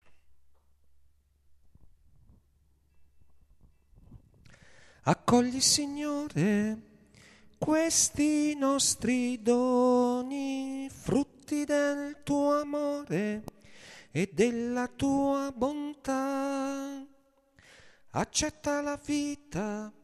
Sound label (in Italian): Accogli Signore tenori